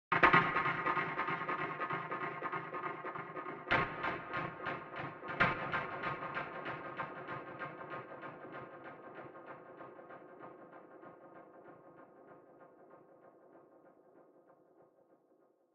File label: Ground Hperc Single; Ricosuite 03